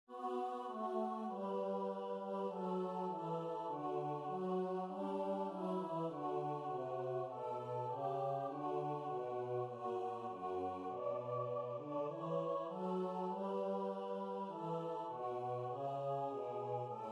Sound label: bas; Der er ingenting i verden; korsats